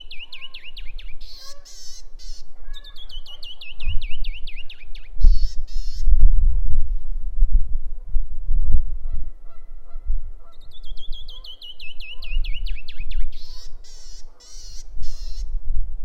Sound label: canyon wren, birds